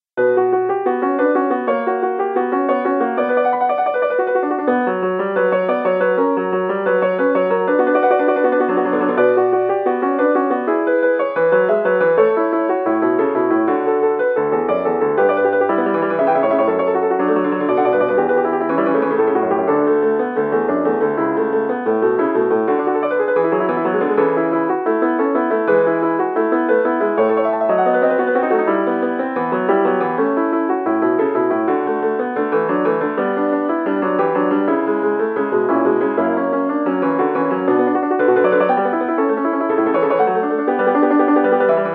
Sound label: inventions a 2 voix piano; bach johann sebastian; 15 inventions